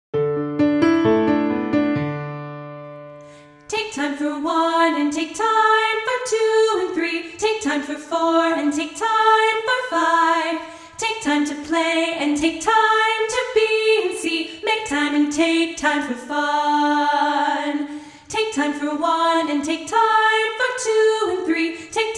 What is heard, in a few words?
Vocals Only A Capella